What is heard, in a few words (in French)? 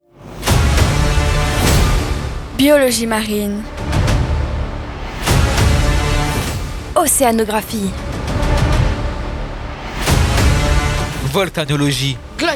JINGLE TRAILER ACTION MOVIE; Cap Au Nord; Cite scolaire Borceliande